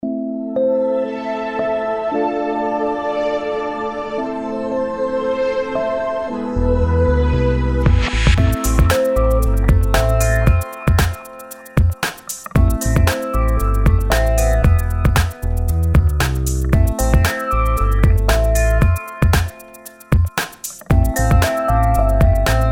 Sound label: Dub Fader; MUSE